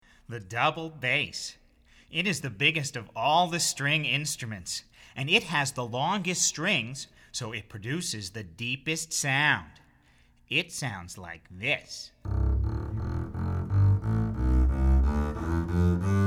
Double Bass

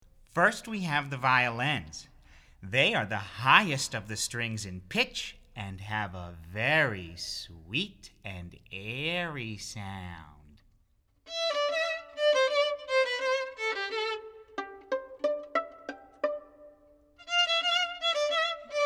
Violins

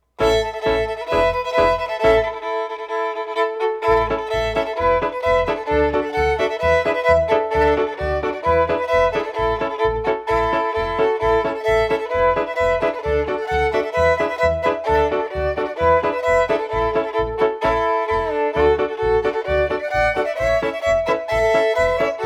American Fiddl

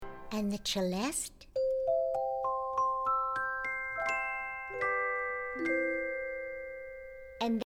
Celeste

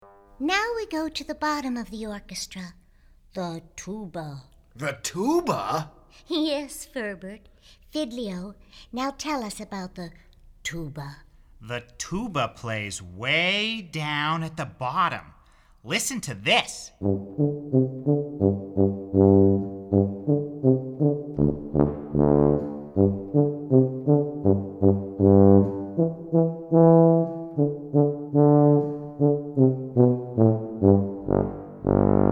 Tuba

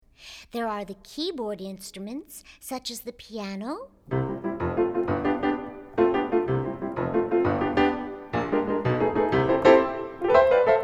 Piano

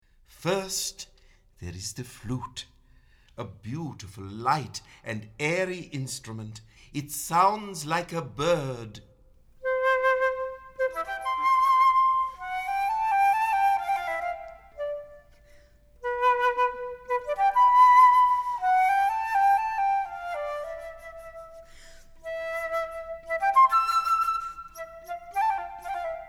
Flute

